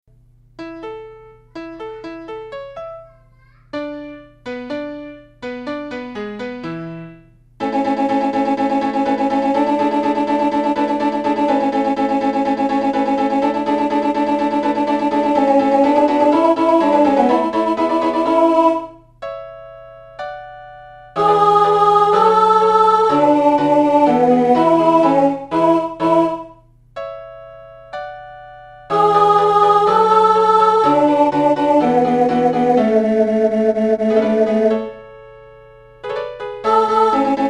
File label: mozart alto F; lyon